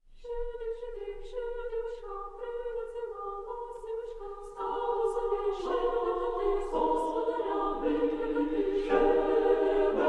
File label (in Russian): schedrik